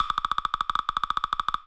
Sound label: Woodpecker